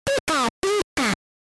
Pikachu SFX; RBY

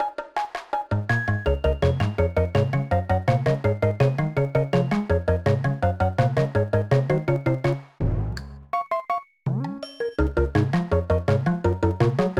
Spin Trade

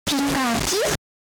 Pikachu SFX; RBY

